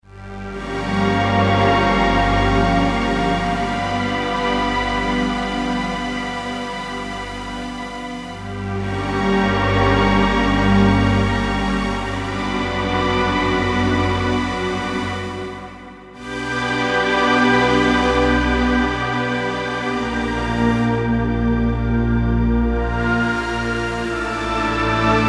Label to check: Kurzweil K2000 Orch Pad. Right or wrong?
Right